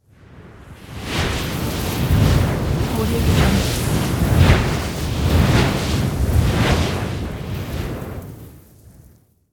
elemental wind cast twister; soundmorph

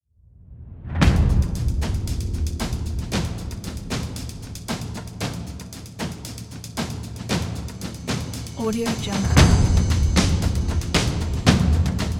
epic drums opener

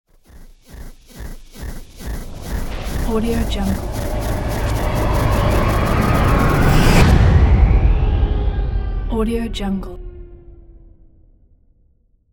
futuristic start fail

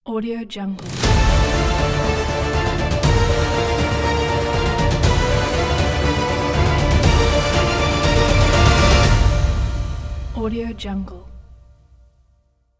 epic orchestral ident

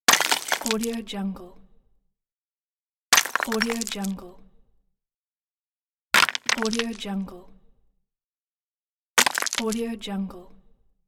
falling rocks

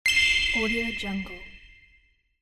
game bonus

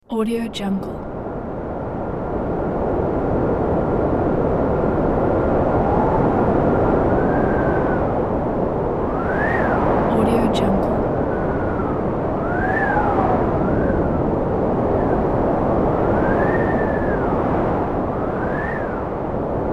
coastal wind

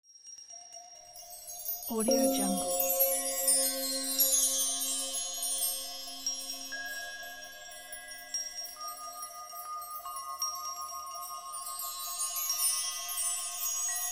heavenly soothing bells

